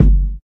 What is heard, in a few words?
dope bass drum single hit g key